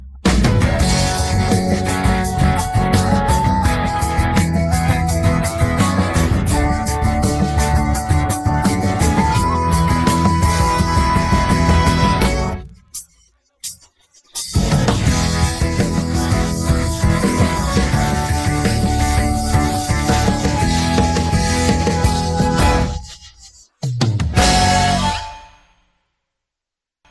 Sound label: instrumental